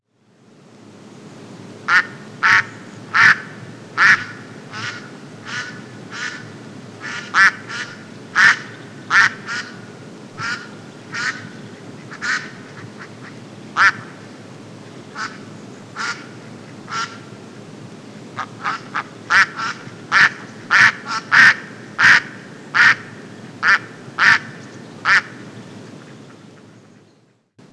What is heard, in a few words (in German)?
zwei enten